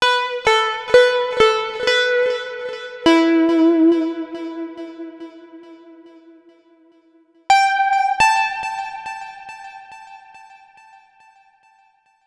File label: Lead Hook, EDM; Sonic Foundry (Sony Creative Software); Afterhours EDM